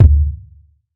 deep kickdrum D key, Royality free kick single hit tuned to the D note. Loudest frequency: 376Hz